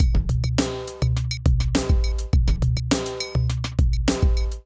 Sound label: Kit groove 103 bpm, Drum; MIDI Clips; West Outer; Ableton; Drum Essentials